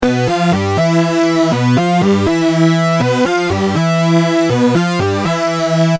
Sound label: mks50 dod 670